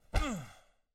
hit grunt; angband

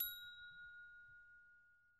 bell ding; angband

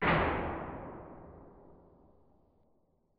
door iron; amb; angband

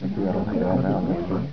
murmur; dopewars

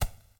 hit hay; angband

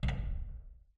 door shut; angband

